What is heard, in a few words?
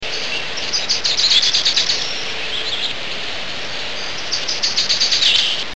Song: a thin rapid little chatter dropping in pitch at end: chichichichichichetchet